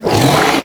Brawler Rock Punch Cast; Warframe; Warframes

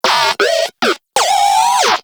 Moa Compassionate Vocal Enemy Spotted; Warframe

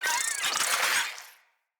Infested Ashen Vocal Attack

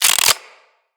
Revolver Pistol Reload Chamber Fast; Weapons

